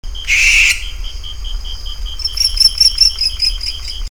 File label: Tyto alba